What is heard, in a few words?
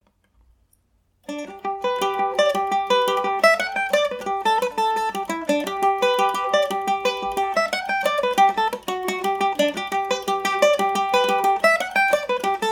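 G major md304, Irish mandolin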